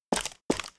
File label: Run; ArkMaster; Gamigo